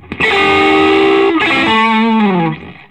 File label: Vintage Blues Guitar, SOLO